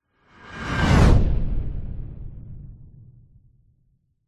science fiction spacecraft super sonic pass by zoom whizz